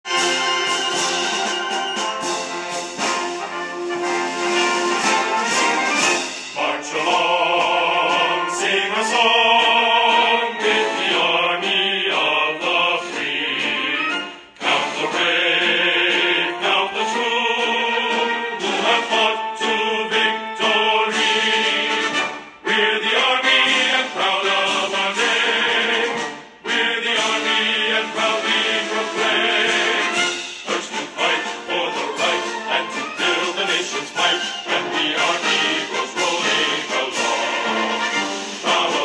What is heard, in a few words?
army song